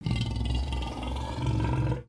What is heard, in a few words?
Pets Lion Idle Med; creatures; Lego